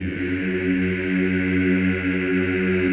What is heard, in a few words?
CHO F#1, Eeh; Eeh + Ehh